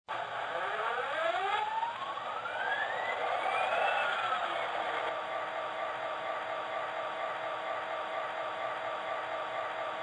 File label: F40PH, trains